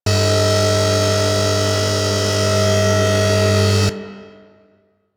Tram Lift Raise Loop; Ambience; Warframe; Corpus Ship Remaster

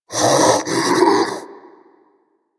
Armadillo Vocal Breathe; Warframe; Hunting Critters

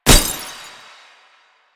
Tank Weakpoint Impact; Enemies; Warframe; Lasrian

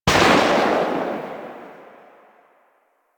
Uzi Pistol Tail, weapons; Grn Uzi Pistol

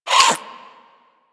vocal attack, critters; Warframe; Hunting Critters; Nexifera

